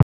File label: Kick Ball; snes; John Madden Football